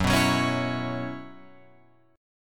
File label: Gb 7th Suspended 4th, Guitar Open F